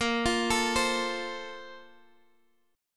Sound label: Bb Major 7th Suspended 2nd, Guitar, Open G